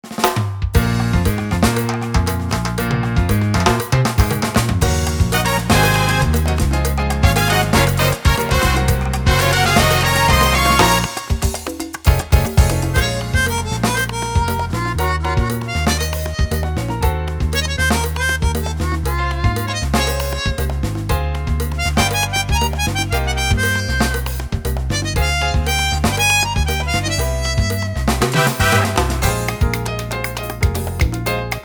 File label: jazz salsa